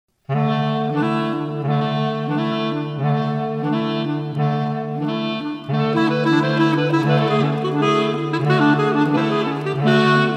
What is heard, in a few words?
piano sonatina bagpipers